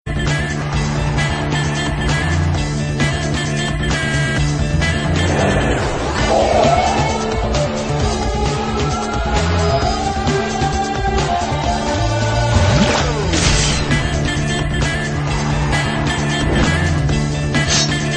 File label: Music